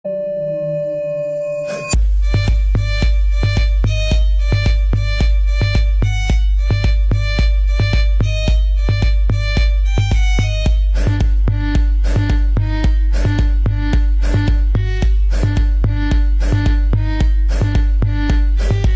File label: Trance music Ringtone; Amazon